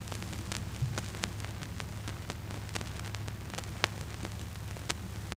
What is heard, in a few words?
Vinyl; SFX; 7rystan's 700+ FREE COLOSSAL EDM SAMPLE PACK